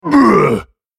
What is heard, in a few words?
spellcast effort